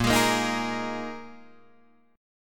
A sharp 7th Suspended 4th down Guitar DADGAD

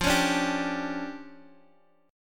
D Minor Major 11th, F

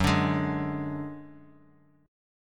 F sharp Suspended 2nd Sharp 5th, Guitar, Open F; strummed; JGuitar

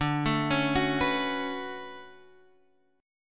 D Major 13th D down Guitar Half Step Down

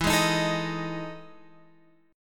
E Major 11th chord

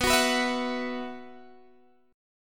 B Suspended 4th, Guitar, Drop D

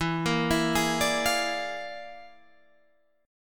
E 7th Flat 5th Flat 9th; E7b5 B9 arpeggiated; JGuitar

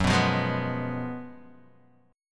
Gb Major 7th Suspended 2nd Suspended 4th

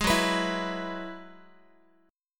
Gb 7th Suspended 2nd Suspended 4th, Guitar; strummed; JGuitar

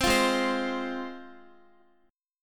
G sharp 6th G sharp down Guitar, G#6 chord; strummed

